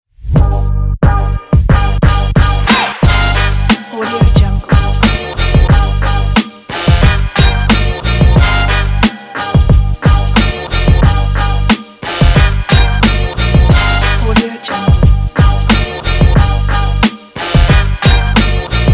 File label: hiphop